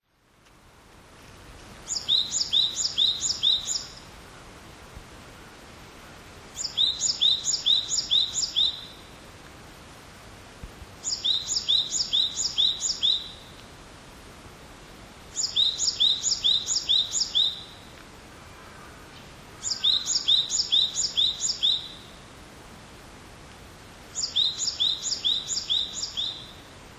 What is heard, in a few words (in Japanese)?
sijyuukara, bird